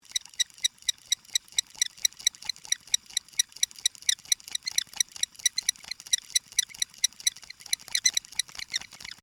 Squeak Handle Wood, Long, Device; Texture; Squeak Sound Effects; BlastWave

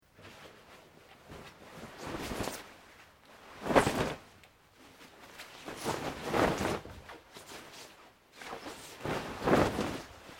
Cloth Nylon Heavy Movement Sequence; Material components for constructing more complex sounds; Dressing

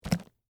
Bible Grab, Handle, Book; Foley; BlastWave

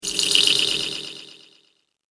Water Spell Shimmer, magic; Fantasy sound effect; role playing games, magic videogames

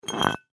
Scrape Glass Bottle, Scrape Glass Bottle Drag; Foley; Blastwave

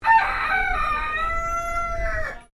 Bird Rooster Chinese Silkie Crow; Birds from Collingwood Children's Farm; Birds (Sound Effects:Animals)

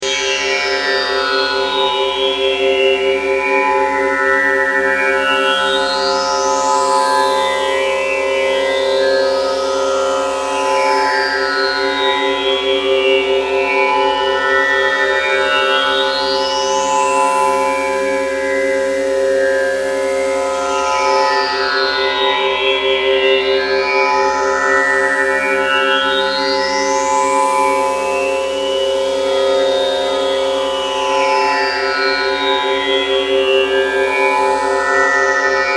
Pulse Ray Long Beam, Science Fiction; Energy

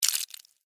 Blood Squirt Spill, Drip, Short; Horror; Blood And Guts Sound Effects; Blast Wave

